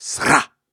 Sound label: Grunts and Chants, Viking Vocal